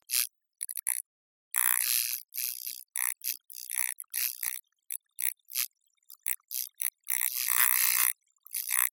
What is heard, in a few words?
bzzzzappatap